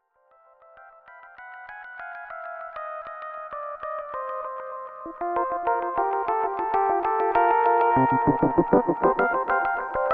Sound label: Pianolude; Christmas Electro Jazz